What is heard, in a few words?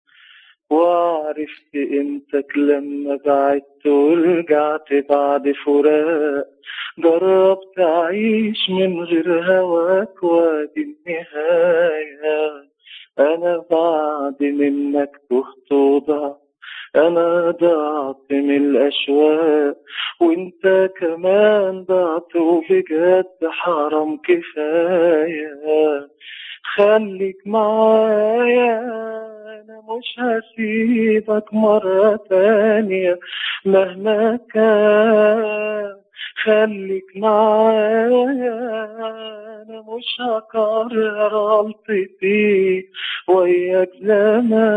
solo